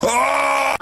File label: aaaaauuuuggghhhhhh